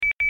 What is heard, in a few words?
connected